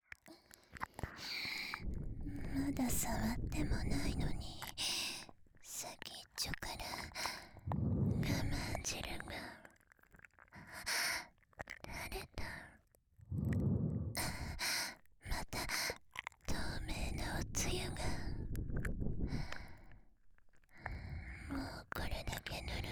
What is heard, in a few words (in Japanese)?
耳舐めされながらナースの彼女にオナホコキ・ベロチュー・顔舐め・フェラ・騎乗位される音声; 【寝ながらイける】寸止め1時間〜いじわる射精管理ナースの終わらない淫語囁き焦らしオナサポ〜